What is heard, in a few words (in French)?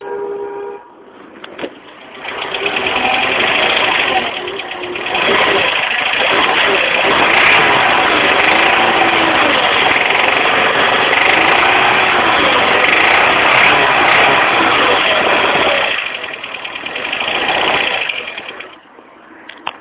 moteur